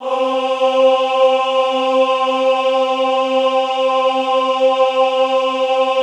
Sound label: AHH C3, Classical Choir; Best Service; Extended Classical Choir; AHH MIX